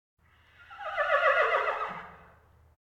Animal effects snorts grunts farm ambience

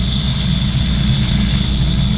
Scifi Forcefield Loop; SFX Kit; Sci-Fi Loops

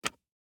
DICE on Hard Wood, Grab, Standard, 2 Two Dice; Samples; ArticulatedSounds; DICE Sfx Party (Extended)

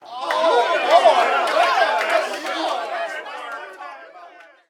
street ball crowd boo; SFX Kit; Crowds

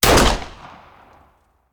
Hand Gun; Sound Ideas; Guns

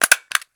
Stapler; Office Supplies; 1001 Sound Effects

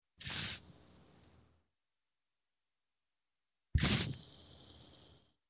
Bottle, Soft Drink Open, twist Cap; Sound Ideas 2000 Series

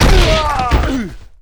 Football Hit Tackle

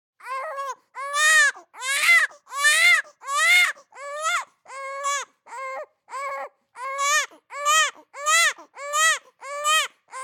BABIES SCREAM Boy 3 months Heavy; Baby Boom

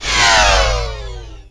ship Lane Change; Space Wave Race

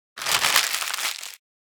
office paper; NCH Library

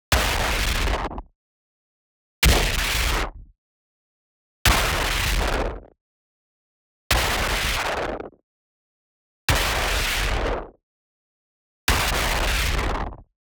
IMPACT WHITE NOISE Noisy Crunch; boom library; Anime Essentials

